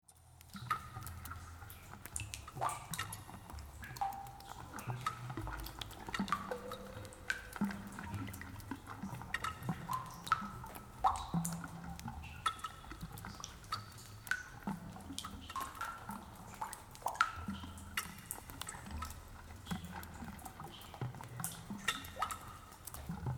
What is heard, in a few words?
ambient